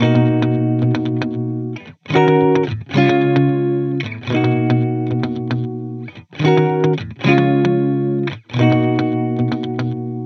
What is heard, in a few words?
guitar loop 112 F#; Pop; Guitar Stacks; SD PASADENA Pop Sample Pack; Slate